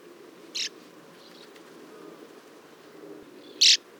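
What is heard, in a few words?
sand martin riparia, flight call; seelhaus see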